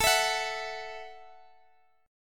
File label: A 5th 6th A, guitar chord; A56 Chord {X X 19 21 19 X} strummed